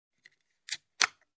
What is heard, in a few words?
Soda can opening